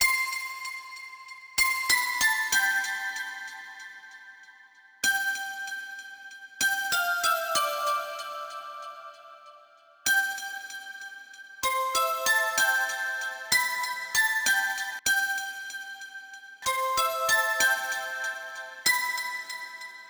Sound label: High bells; Vostok Inc.